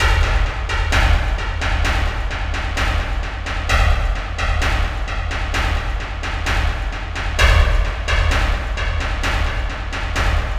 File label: Industry, Percussion